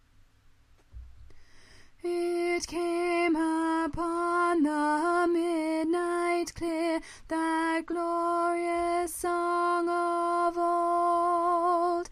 It Came Upon The Midnight Clear alto, choir

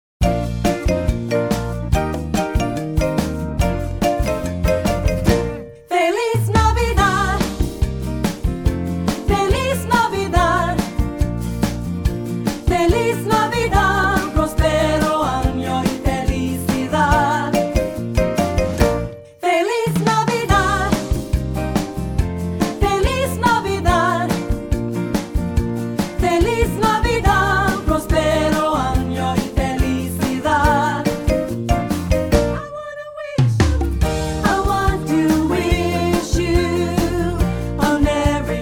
Alto, choir

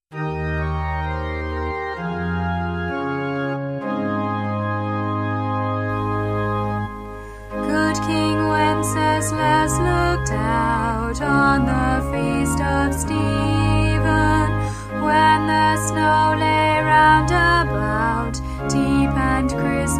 Good King Wenceslas alto, choir

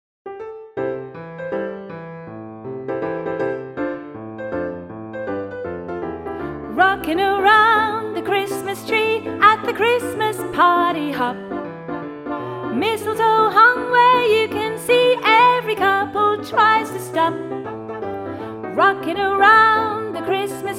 rehearsal track voice

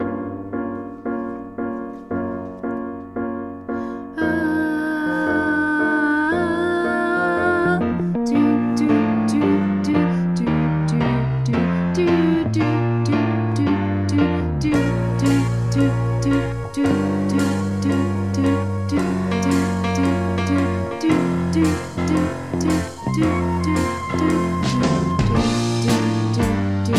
tenor, choir

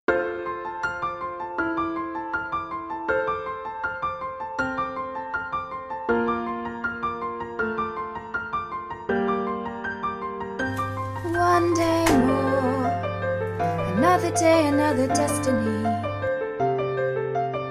with piano tenor

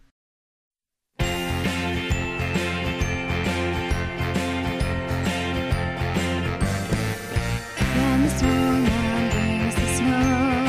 alto

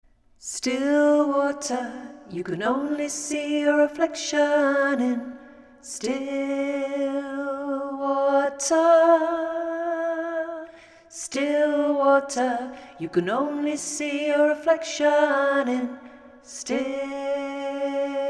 vocals only

